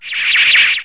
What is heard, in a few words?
combo